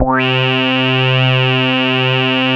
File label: Poly800 C3, Korg; Back In Time Records; Korg Universe VOL-1